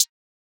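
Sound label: hi-hat, closed hats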